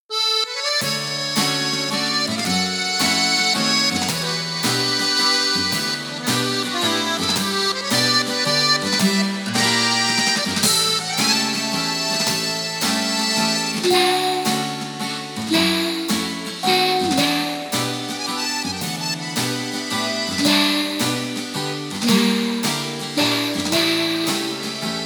Picnic, ringtones

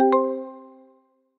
Plug Power Dock; Asus Padfone-2

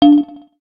electric, keytone

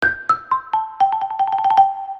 marimba

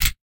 Keypress Delete; LG Wine Smart F480K

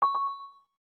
crystal lock, ui; LG Optimus L90 D415

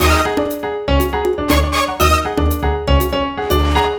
Latin, ringtones; Google; NewWave Labs

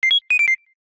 Pen beep; Samsung Galaxy S4 Mini Black Edition

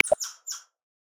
Golden-Headed Lion Tamarin, Amazon; Xiaomi Redmi-Note-8-Pro

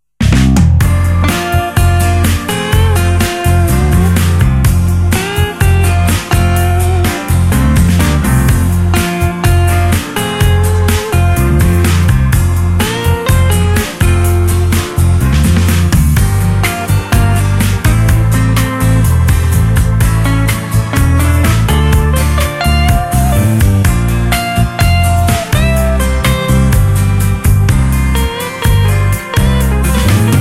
Cadence Blues; Meizu MX2; ringtones

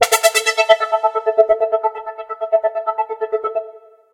saw; Samsung GT-i7680; cmcc music

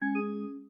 Wireless Power Connected; Huawei P30

